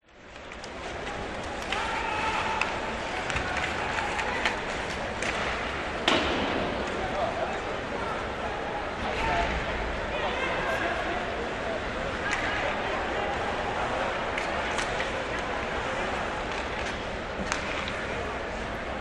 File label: ice hockey match crowd ambience game play crowd cheer